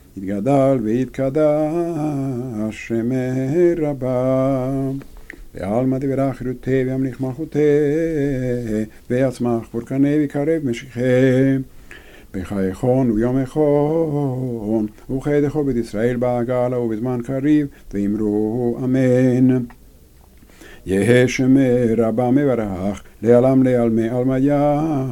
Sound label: Half Kaddish, Shabbat Shaharit